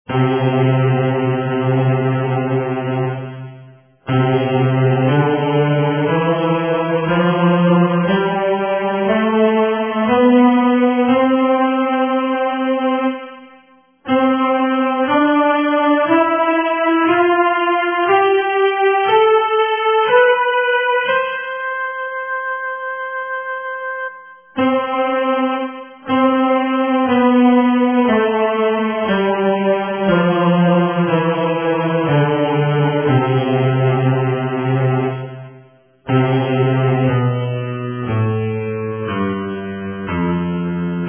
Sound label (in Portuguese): testvoc tenor